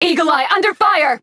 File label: Taking Damage